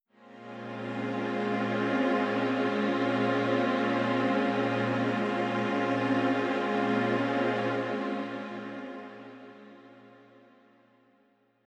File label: string Note; Wiwi Digital music technology